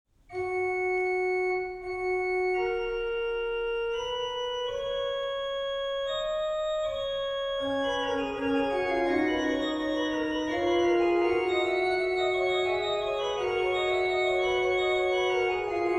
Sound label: Bach Organ Works; BWV 703; Gottes Sohn ist kommen; Individual Chorale Preludes